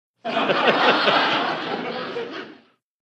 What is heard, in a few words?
Crowd Light Laughter, medium